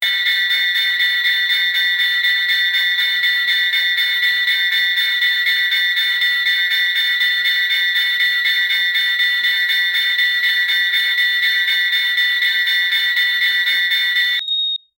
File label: urgency alarm sound alert, air raid